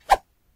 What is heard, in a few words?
woosh; Foley; Kenney's Sound Pack